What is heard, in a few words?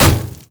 axethrow land; pentakill olaf